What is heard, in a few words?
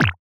hit bone; pinball